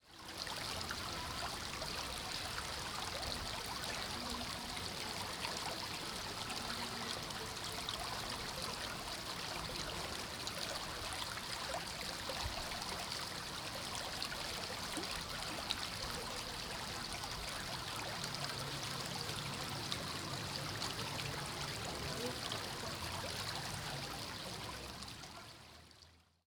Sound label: small stream on the street; ambience; NS Studios - ultra sound collection